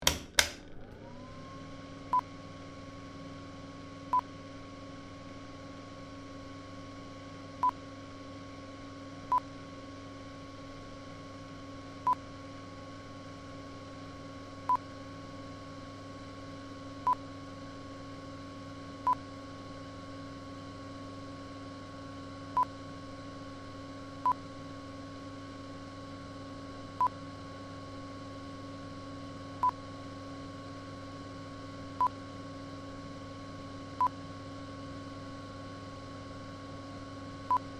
Bathroom Extractor Fan

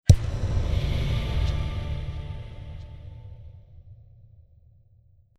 Drum Impact

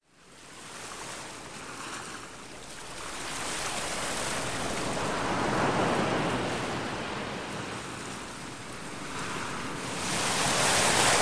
Tranquil Sea

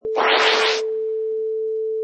DJ Record Scratch

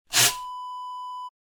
broom sweep single

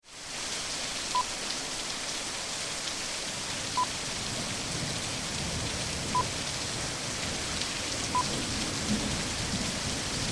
Heavy Rain And Thunder

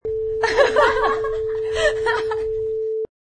Ladies Giggle

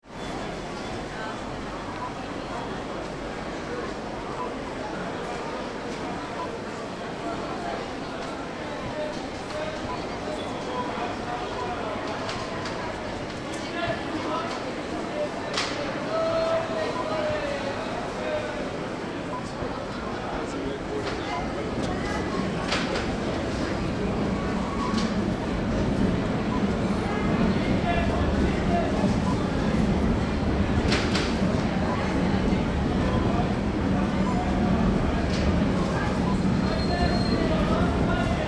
London Market Borough

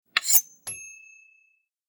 sword

